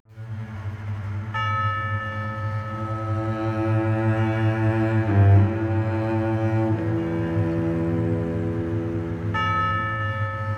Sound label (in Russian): violonchel